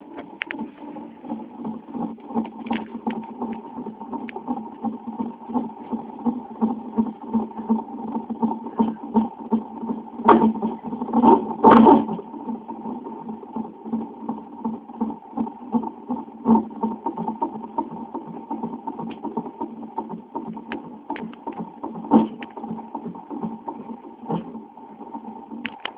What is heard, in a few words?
heat beat